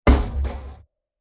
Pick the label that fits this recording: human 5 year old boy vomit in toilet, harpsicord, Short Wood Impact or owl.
Short Wood Impact